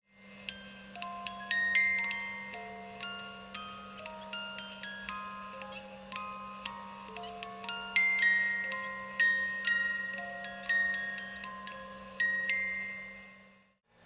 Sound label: Music Box; Kairi